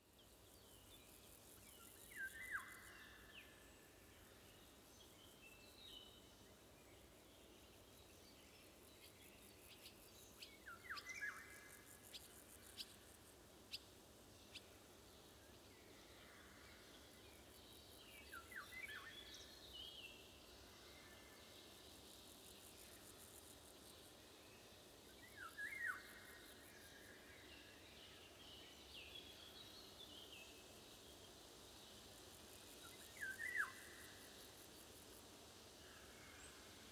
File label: golden oriole wind